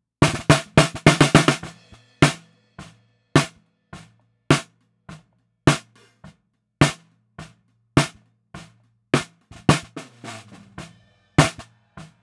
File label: fat ukko